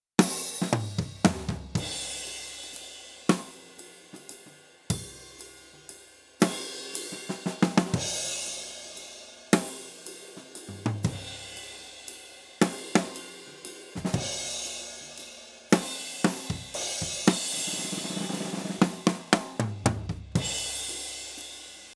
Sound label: DRUMS; MOTU HD192